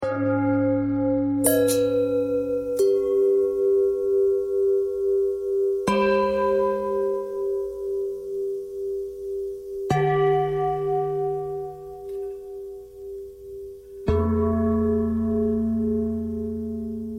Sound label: long bell bell thread noise